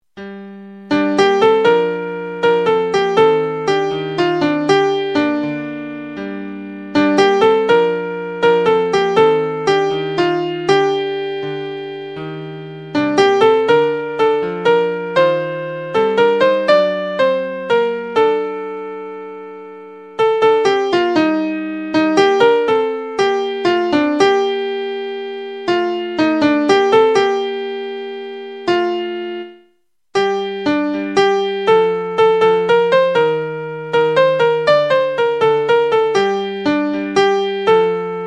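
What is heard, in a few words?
midi soprano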